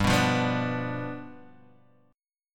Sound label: G 7th G down Guitar Open F; G7 strummed; JGuitar